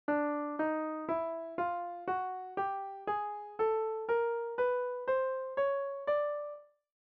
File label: Chromatic scale